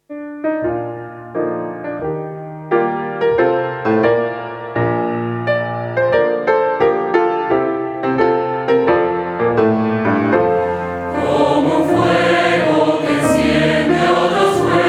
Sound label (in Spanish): Himno CORAL; UAH